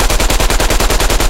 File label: loop maxim, guns tanks